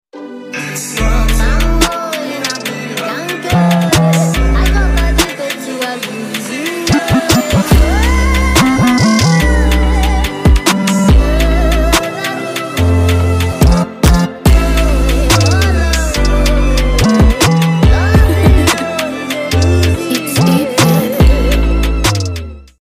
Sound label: Drill Version